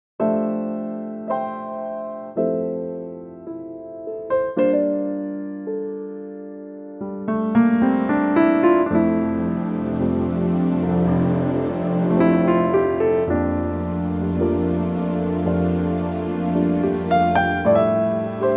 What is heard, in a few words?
F piano and strings; ballad; key - F - vocal range - C to F; Beautiful ballad arrangement